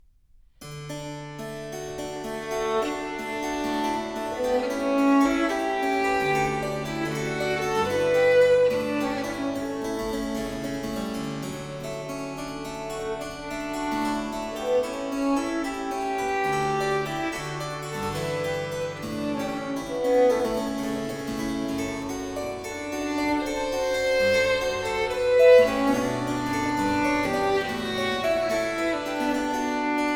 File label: Adagio, Sonatas for Violin and Harpsichord; Sonata No. 4 in C Minor, BWV 1017; Bach; J.S. Bach